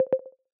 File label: notification, ringtones